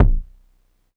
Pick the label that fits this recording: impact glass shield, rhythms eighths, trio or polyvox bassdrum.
polyvox bassdrum